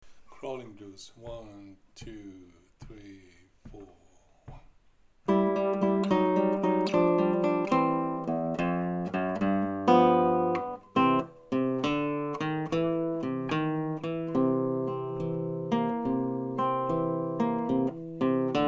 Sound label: Crawling Blues, gitarre